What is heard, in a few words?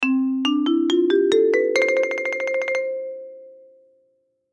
Marimba Scale Up, Marimba Scale Up Roll End; Mallet; Blastwave; Sound Effects:Musical